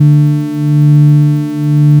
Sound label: additive cello single; FFTimbre